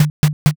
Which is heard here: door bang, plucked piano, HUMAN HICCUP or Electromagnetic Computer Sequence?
door bang